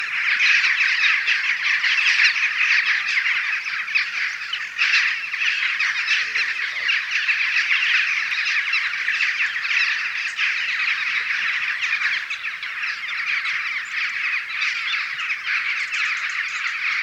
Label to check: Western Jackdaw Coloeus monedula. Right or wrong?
Right